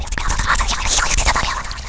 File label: whispering, ships engine; Quantum Redshift; XBOX; kukai